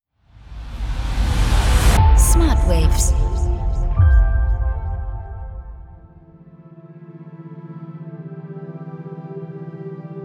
study focus

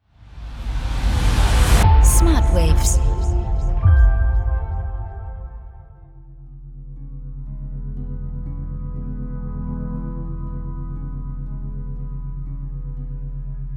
Meditative State; THETA waves

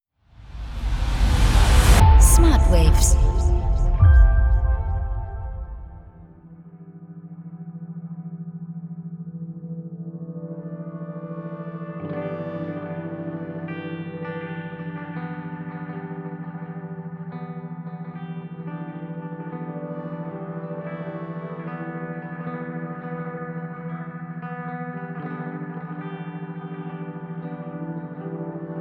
easy study; Beta pro

